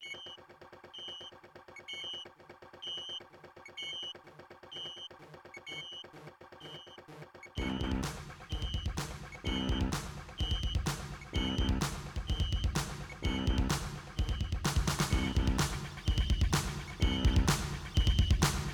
intro, ensoniq elite; Ensoniq Sounscape Elite; soundcard test

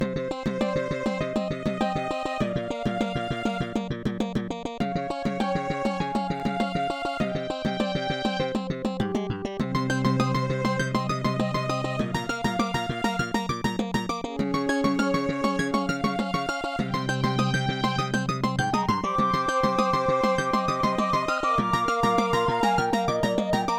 intro, Nvidia NV1 GM mode; soundcard test; Hocus Pocus

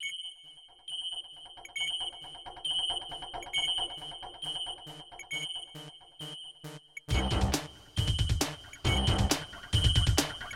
kawai gmega; Soundcard test

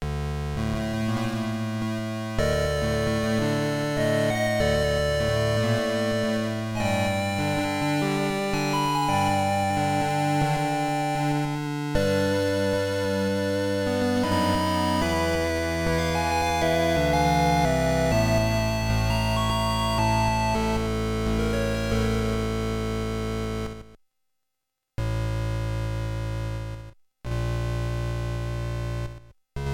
sb 15 ct1320 (cms); soundcard test; Soundcard test page

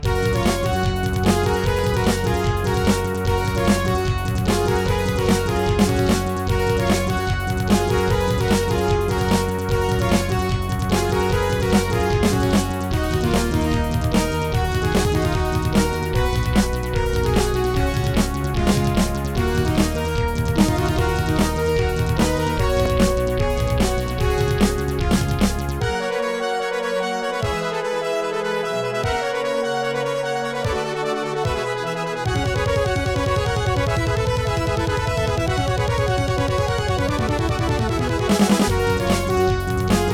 nec xr385; NEC xr385 (Yamaha DB60XG clone); Soundcard test; tyrian

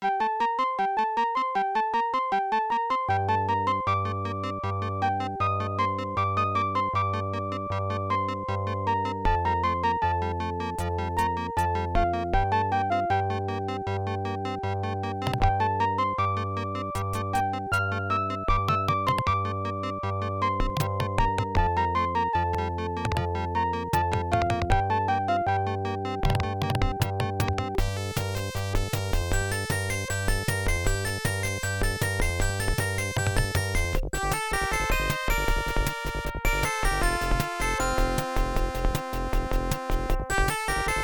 intro sb 1.5 ct1320 (adlib); Creative SoundBlaster 1.5 ct1320(adlib); soundcard test; silpheed

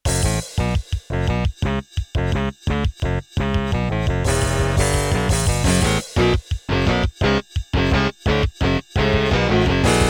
gus pnp (megaem gm); Soundcard test page; Gravis Ultrasound PNP

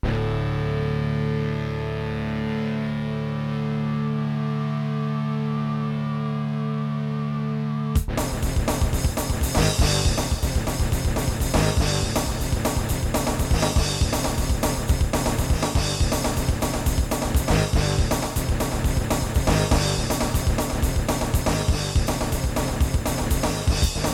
gus pnp; soundcard test; Doom; Gravis Ultrasound PNP (external player and utopia)

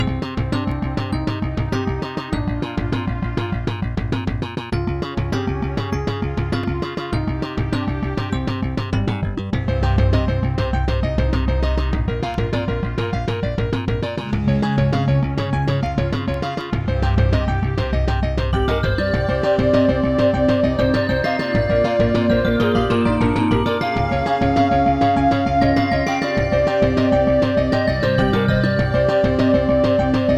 turtlebeach tropez; Chips: WaveFront ICS2115v, Crystal CS42; soundcard test; Hocus Pocus; Soundcard test page

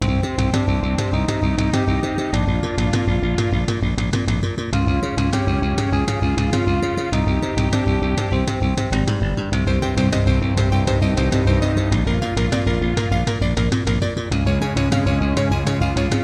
intro roland scc1; soundcard test; Hocus Pocus

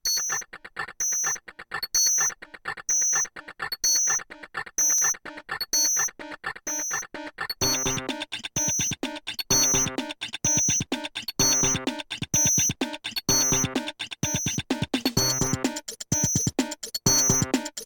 nvidia nv1 (gm); Nvidia NV1 (GM mode); Soundcard test

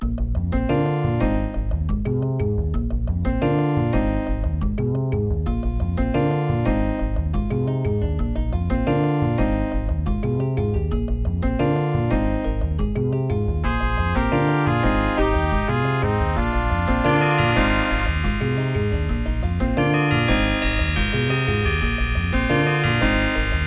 level1 gus classic; Soundcard test page; Gravis Ultrasound Classic; Hocus Pocus

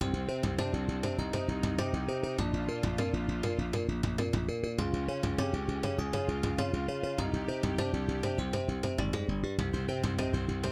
intro korg ai20; Soundcard test page

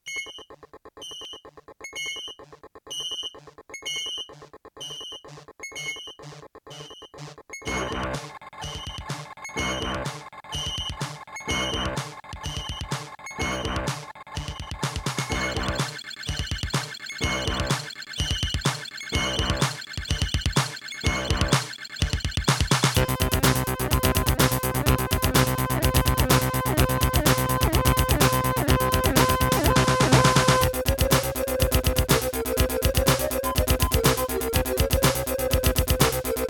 terratec ws siwt1; Terratec WaveSystem SIWT-1; Soundcard test

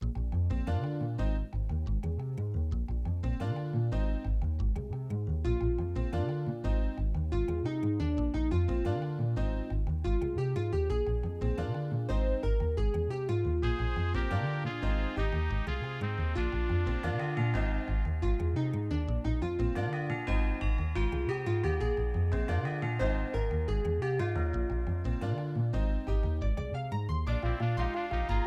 level1 yamaha sw60xg; soundcard test; Hocus Pocus

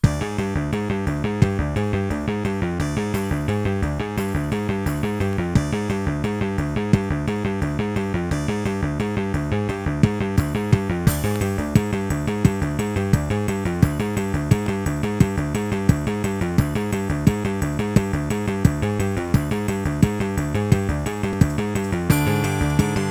nvidia nv1 gm; Nvidia NV1 (GM mode); soundcard test; Doom TNT

